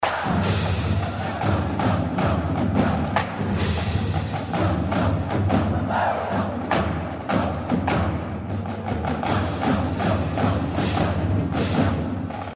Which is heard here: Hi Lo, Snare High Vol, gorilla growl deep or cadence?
cadence